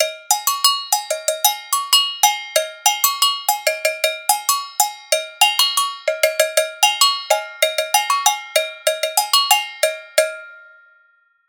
Pearl PTA 30 Tri Agogo Bells; آگوگو سه تایی پرل مدل PAT 30; آگوگو و آگوشا; سازهای موسیقی; سازهای کوبه ای; percussion; پرکاشن; ادوات

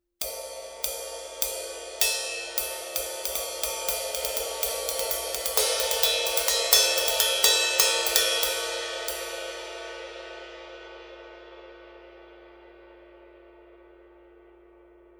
20″ AA Medium Ride

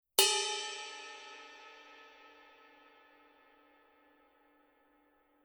Color Sound 900 Red Mega Ride Paiste

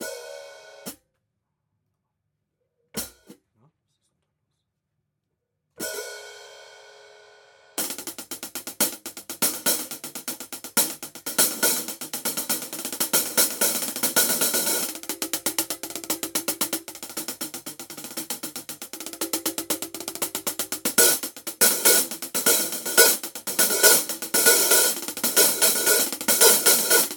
Paiste PST8 HH Groove

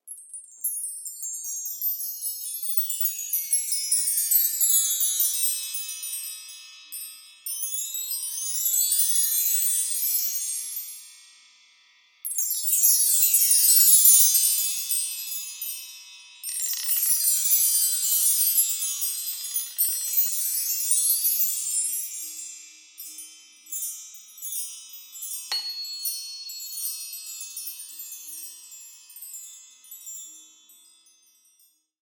Meinl CH33HF

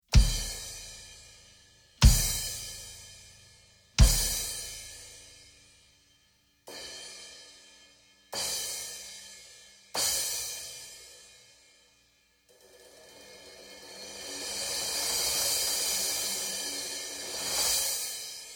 16″ XSR Fast Crash

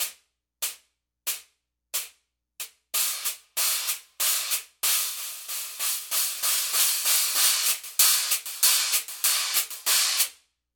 PST X Swiss Hats Paiste

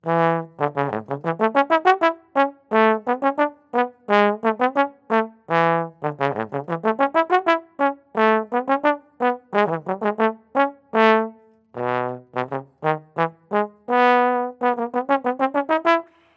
Yamaha YSL 356 GE Trombone

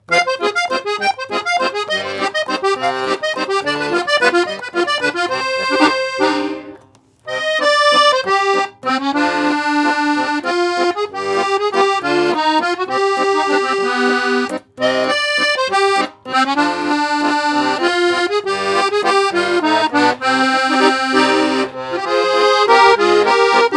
Hohner Bravo III 72 Black

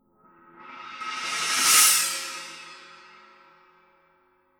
Color Sound 900 Black China

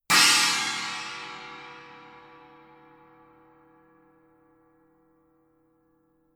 Color Sound 900 Blue China Paiste

